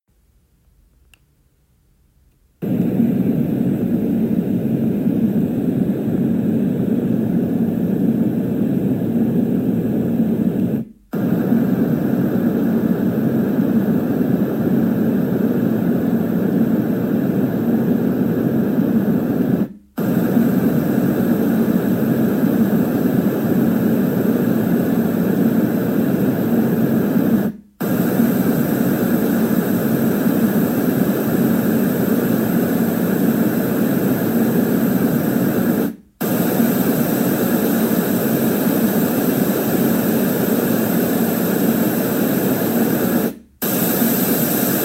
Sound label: Dreamegg D3 Pro White Noises Samples